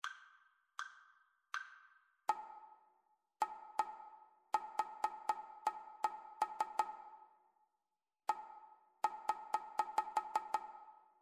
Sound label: rhythmic dictation